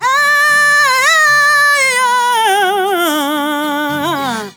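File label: ZULU CALL, SOLO FEMALE; Spectrasonics; Heart of Africa 2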